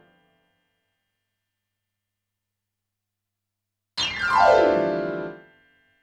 PIANO, RNR for piano

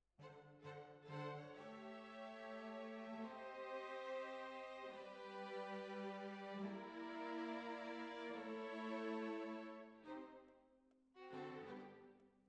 Oboe

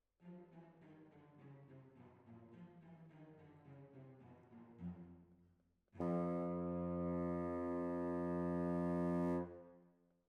Clarinet

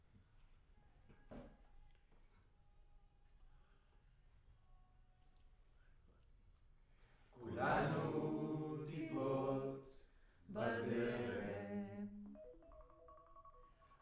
Room, Choir

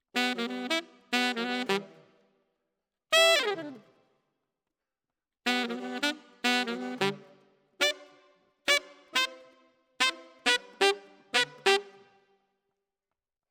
Alto, RNR for piano

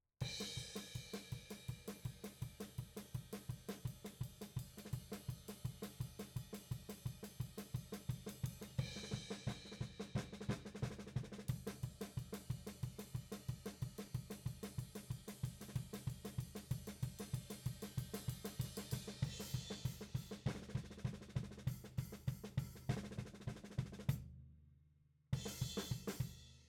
Tom

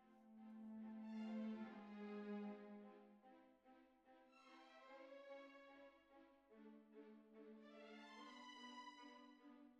Cbasses

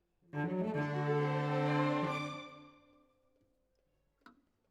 Cello Solo

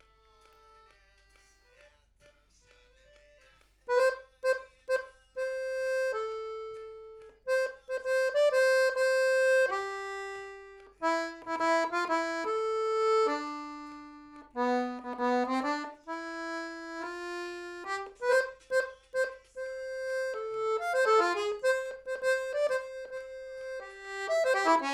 Accordeon MAIN

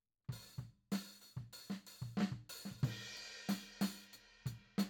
Tom

